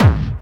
hardbassdrumz, breakcore; Bazzdrumz

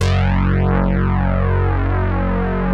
POLY SYNTH; Wizoo - Powered Wave

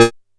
A#3, Bass; E-MU Producer Series Vol. 6 – Analogia Project; Boom da Bass

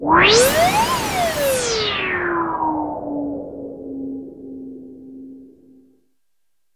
SYNTH FX

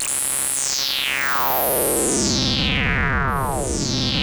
Moog C3; Hollywood Edge; Giorgio Moroder Rare Synthesizer Collection; MLTI.MOOG

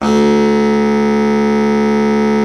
BARITONE SAX; Best Service; Killer Horns

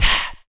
Voice Tah; Roland BK-5

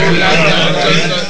saloon; Amiga Soundtracker Sample Packs

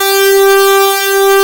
Aud Edge C4, Xtreme Lead; Digital Sound Factory Xtreme Lead

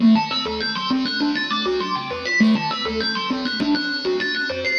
philter (seq)

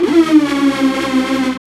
SYN TECK, Dance Synth; Sound & Vision - Gigapack I CD 2

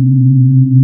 C3, Synth Line; 90 s Sample CDs; NiceBeats; SynthLine VOL-2

